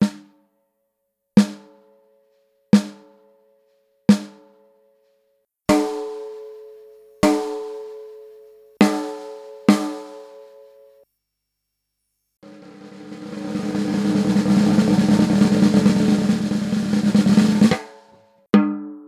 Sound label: walnut 14x5, snare drums